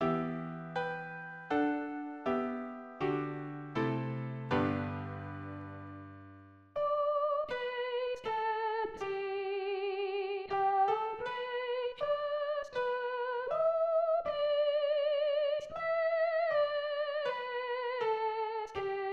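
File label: Bass; O taste and see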